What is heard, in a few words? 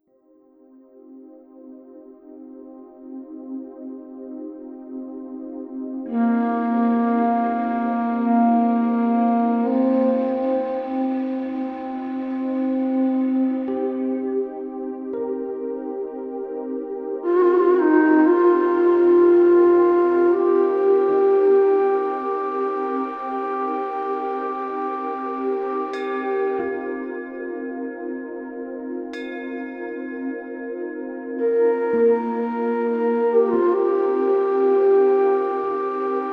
Zen Flute Sunrise; Qigong-Music